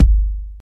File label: tight lows steel kick drum g key, Royality free kick single hit tuned to the G note.; kick drum samples